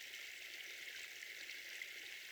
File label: Waterway E Light